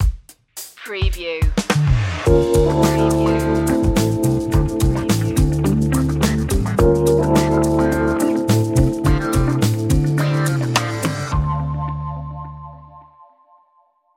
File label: acid jazz